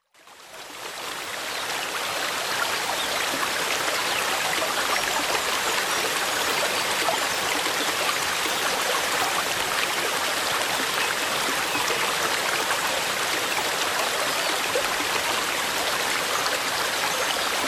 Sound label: Mountain Stream